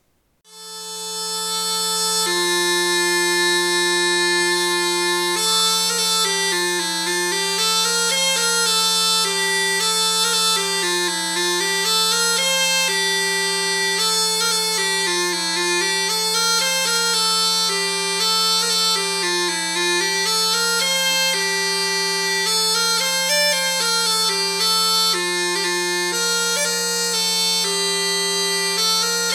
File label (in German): huemmelchen